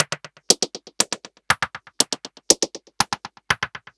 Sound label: Modular Noise Rhythm (120BPM); noise hiss crackle